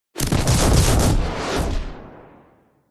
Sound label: science fiction projectiles incoming multiple impacts; zapsplat